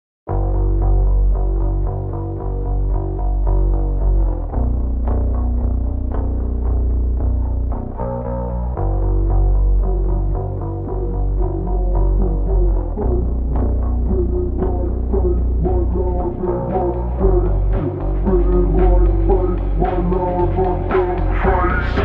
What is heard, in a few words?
super slowed reverb